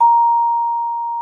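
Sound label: vibraphone A#5; Xsample VOL-4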